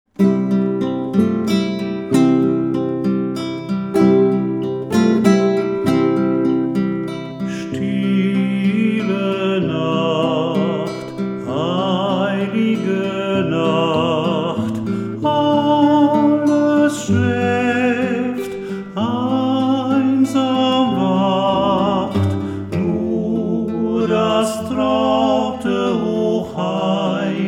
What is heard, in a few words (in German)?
Stille Nacht, heilige Nacht, sound plattenspieler weihnacht